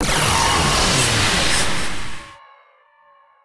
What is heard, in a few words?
pain; Quake 4 Alpha; strogg; valkaryne